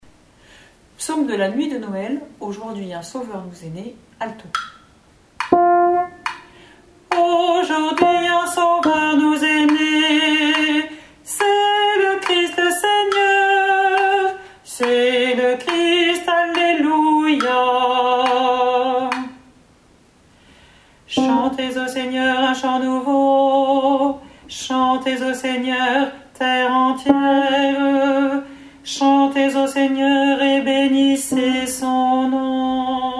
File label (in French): ALTO; Psaume 95 Nuit de Noël